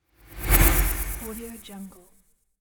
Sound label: music-fish